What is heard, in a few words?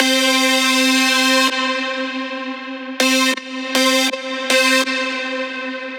reverb ducking